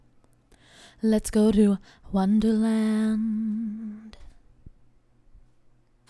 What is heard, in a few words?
main vocals